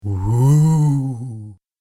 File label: Wooh Funny Ghost; Ghost Sound Effects; Andrew Fleming